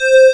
sqr wave; cubic

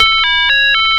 Chime; Focus AudioClock v3.1.1

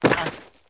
land; Daikatana

